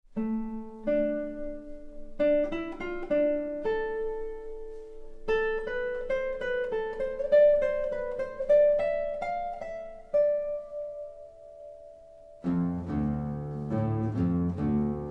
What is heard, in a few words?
guitar brigade